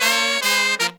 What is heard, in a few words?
Pop & Funk Brass